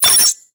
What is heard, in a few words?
chassis out